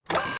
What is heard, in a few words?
Gatling Gun Wind Up